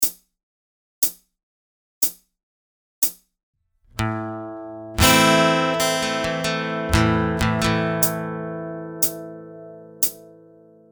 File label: Pick Strumming the Modern Strumming Pattern SLOW; Ultimate guide to strumming book